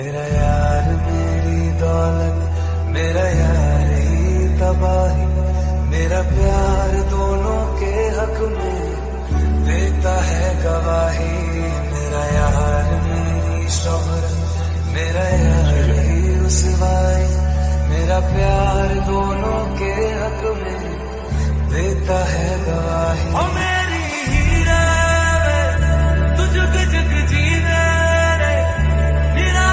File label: hindi ringtone